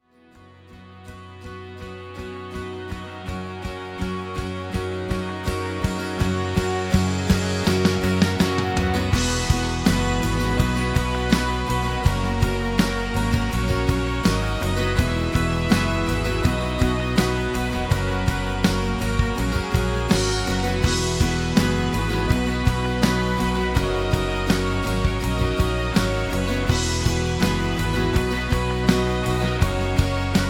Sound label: rhythm